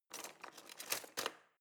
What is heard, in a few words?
knives wood drawer grab